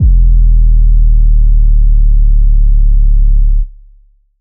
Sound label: phonk kicks oneshot 808 e; trap kick drums